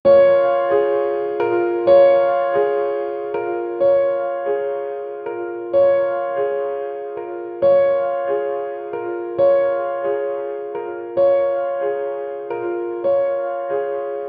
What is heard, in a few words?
a soft melancholic piano; melancholy